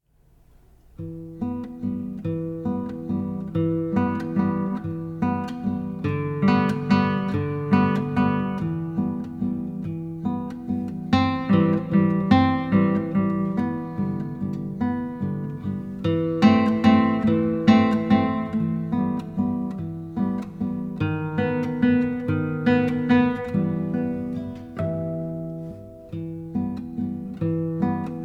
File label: Minor Waltz, guitar